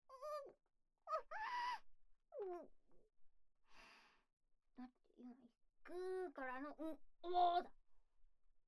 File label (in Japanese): うおおおおおおおおおおお; NGテイク